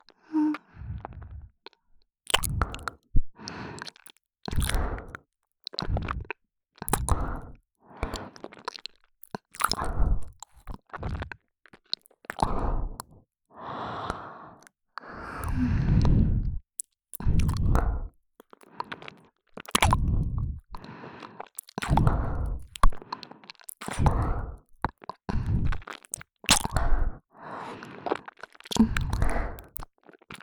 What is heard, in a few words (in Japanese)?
ぱか…ぱか…（唇の開閉）, ASMR